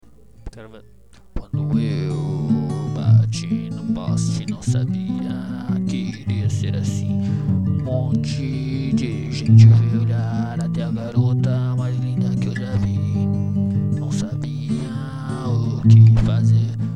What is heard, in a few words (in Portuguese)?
Acústico